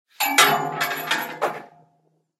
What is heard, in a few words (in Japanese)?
お賽銭